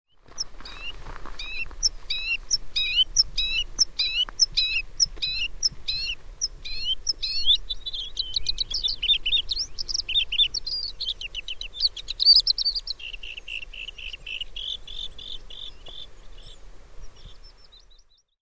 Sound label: bluethroat